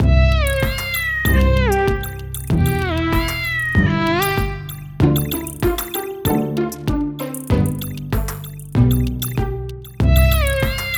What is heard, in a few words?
Cats Meow; 今井安紀